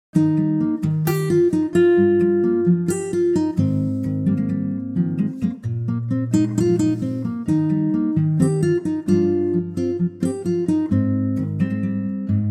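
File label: Eb acoustic guitar; key - Eb - vocal range - Bb to Eb; Superb acoustic guitar arrangement in a light Bossa feel; light Bossa feel to keep things moving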